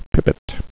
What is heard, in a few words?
pipit